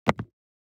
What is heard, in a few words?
DICE on Neoprene, Throw and Roll, Foam, 2 Two Dice; Samples; ArticulatedSounds; DICE Sfx Party (Extended)